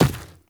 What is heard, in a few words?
Infested Medium Run Dirt, Footsteps